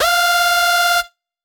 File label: Lead - F; Instrument Oneshots; 99Sounds; Monosounds